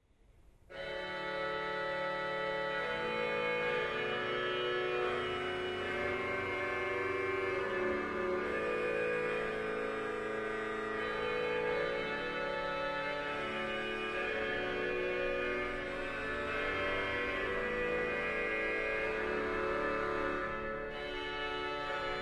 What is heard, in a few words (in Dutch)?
improvisatie, kerk; maastricht; mathkerk